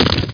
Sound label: block